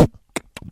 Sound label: Beatbox, Drum loops